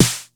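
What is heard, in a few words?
909 snare drum, TR-909; Crypton - DrumBase5000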